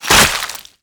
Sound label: zombie death; guns n zombies 2014 pc